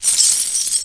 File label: sickle and chain